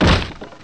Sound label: rock destroy